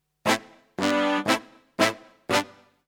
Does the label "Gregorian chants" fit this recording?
No